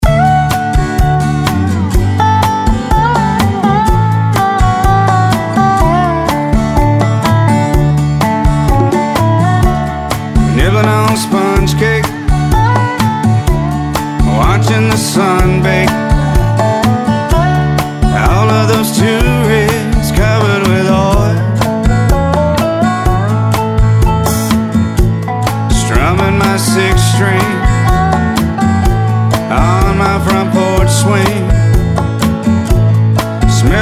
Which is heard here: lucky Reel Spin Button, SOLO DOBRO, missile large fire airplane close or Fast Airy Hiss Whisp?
SOLO DOBRO